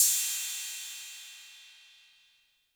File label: Cym B 808 Tape Decay B; Cymbal; Clean